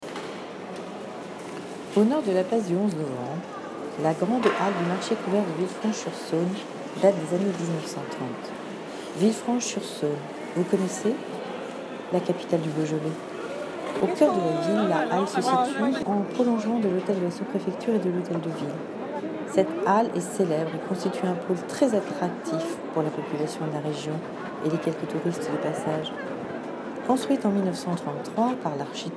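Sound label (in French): le marché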